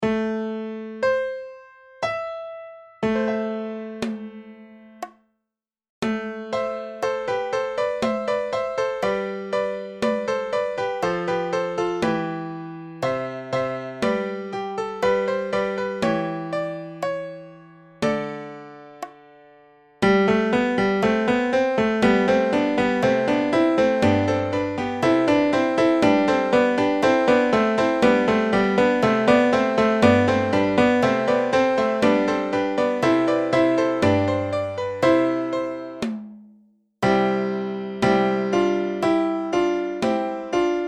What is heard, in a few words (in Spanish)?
tutti, midis